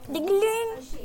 dingalinggg

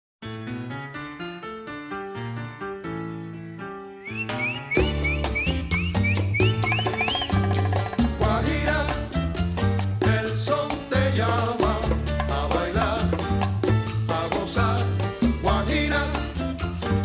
afro cuban

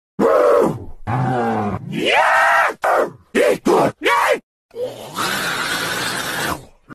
zombies noises; cod

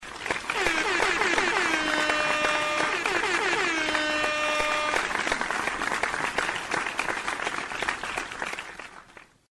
horn clap

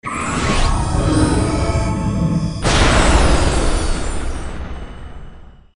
shot

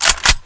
reload; accelerant

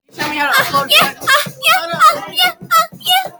uhuhuhuh

